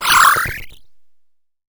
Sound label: randomize, 8 bits; Game Sound Solutions - 8 bits Elements